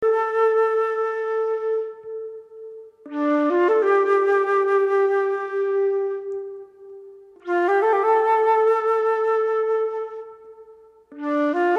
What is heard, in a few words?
music yoga